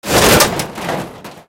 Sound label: metal small crash; tanks; War Thunder